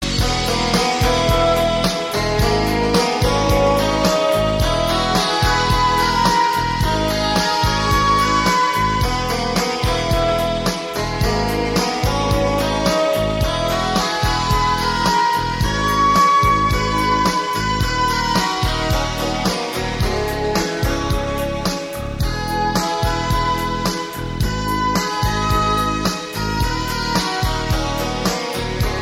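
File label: saxophone instrumental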